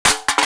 metal can on cement, clattering

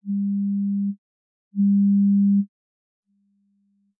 antiphase; headphone Check Stimuli

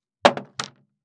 drop top, large plastic flat cuttingboard

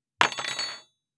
drop, small metal long thin spoon

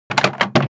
drop rand shuffled, large rubber flat squaremat

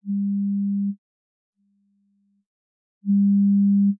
antiphase, headphone Check Stimuli

